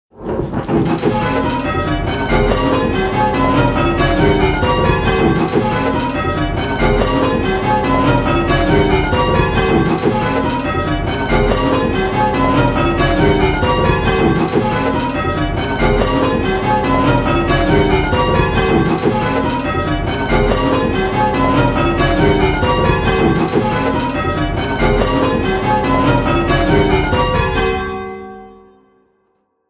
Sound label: Striking 10-Bell Call Changes; Pebworth